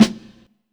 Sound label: Lo-Fi Cold Snare; DRUNK DOG